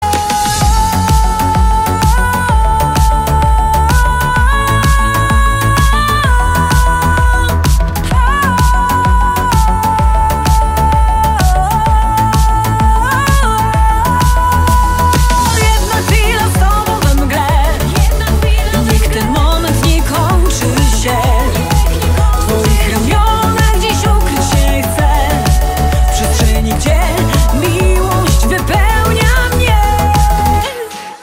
disco

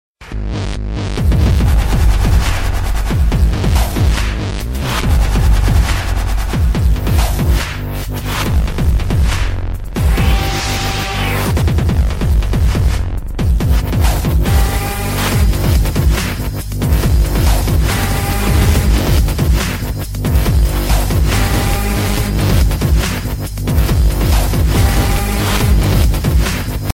dubstep; far cry 3

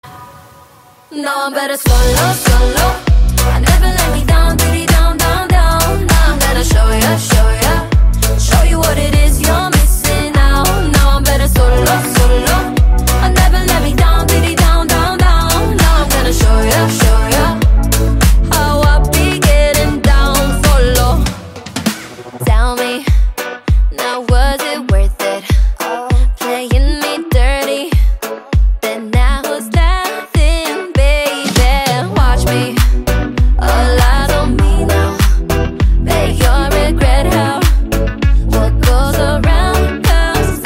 solo

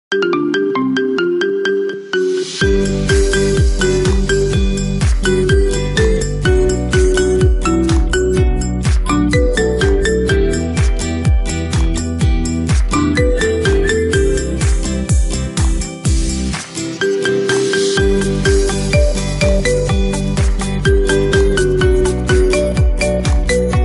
Marimba Remix

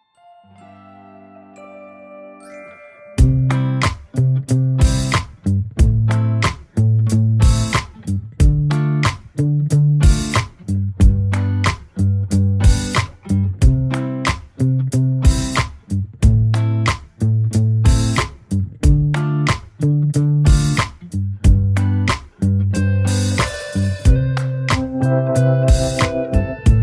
Instrumental

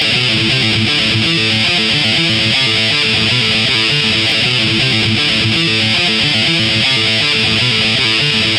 guitar riff blue

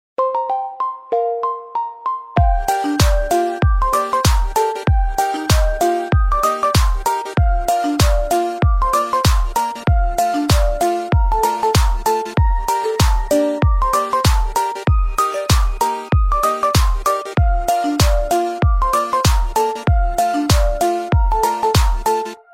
Marimba

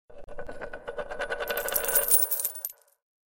vivo s1 pro notification

